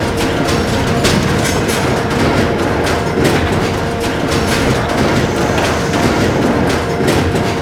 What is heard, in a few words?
Fortress Cannon Movement Loop; Ambience; Gameplay; Warframe; Grineer